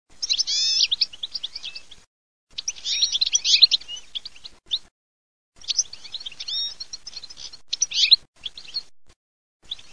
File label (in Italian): CARDEL IN CARD